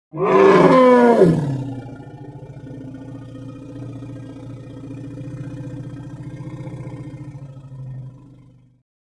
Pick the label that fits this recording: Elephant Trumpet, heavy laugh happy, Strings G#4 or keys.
Elephant Trumpet